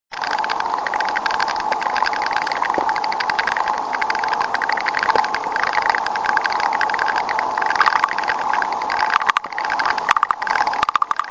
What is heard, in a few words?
Termite Sound